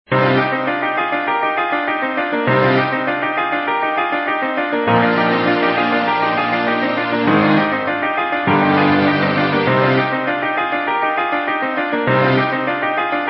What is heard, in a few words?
flames piano ringtone